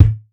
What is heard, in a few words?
raw kick drum sound a key; kick drum samples